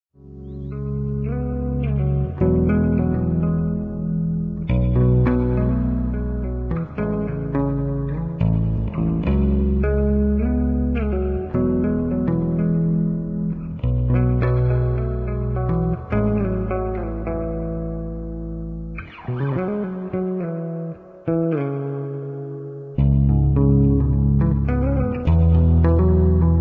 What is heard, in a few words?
Guitar Electric Bass; Zomboid; Lifestyle